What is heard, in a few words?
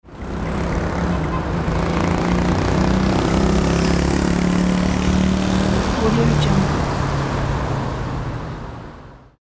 motorcycle passing by